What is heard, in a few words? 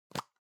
object plastic can lid screw open medium; smartsoundfx